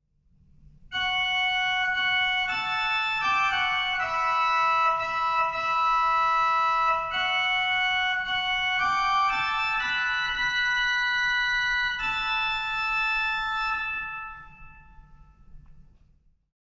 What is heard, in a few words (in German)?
Register 5fach, organ